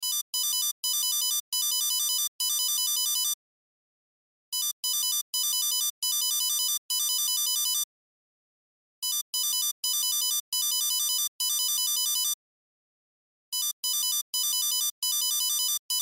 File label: Cell Ringtone; Loop; Cell Phones; Blastwave